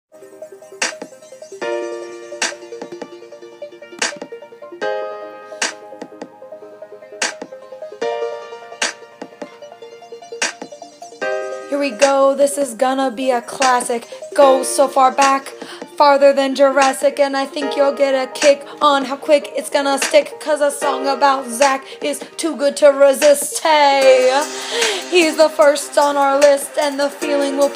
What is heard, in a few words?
birthday rap